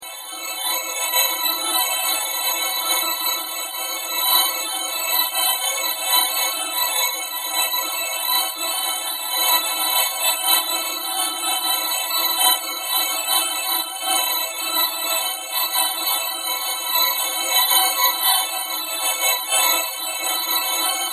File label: Ambient Drone Ghost Chatter Electric; Sound effects created by manipulating other sounds; Scary Soundscape; SoundEffects-Foundation1